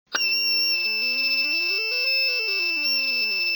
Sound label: squeal